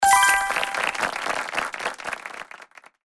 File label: powerup extra points